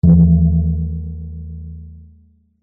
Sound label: musical instrument gong gamelan hit soft